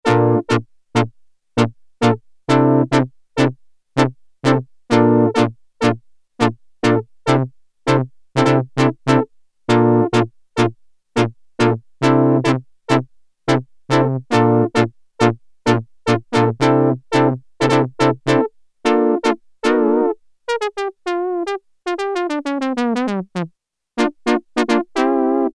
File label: Trombones; Sequential Circuits Prophet-5 (Ver.3); Factory Patch; File 3 Programs